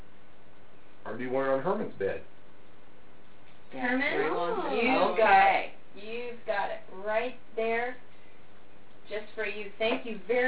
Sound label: emf hits in childrens room